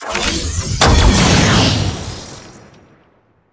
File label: laser